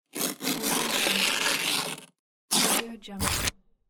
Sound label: tear a sheet